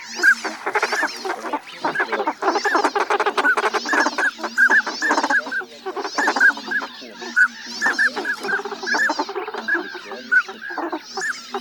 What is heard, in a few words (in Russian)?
Шилохвость Codone; plurifon